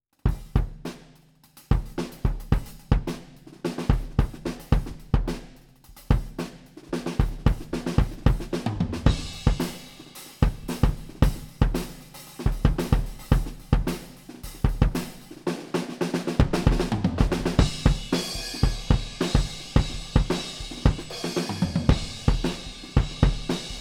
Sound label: Kick Head On; 35cm; U47 FET Outside 30cm